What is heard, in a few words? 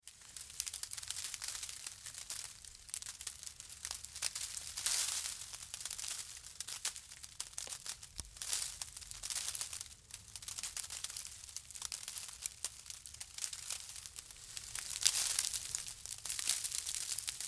Hoof Movements Hay, Hoof Movements Hay Feet Barn; Close Perspective Hooves; Farms; Farm Sound Effects; Animal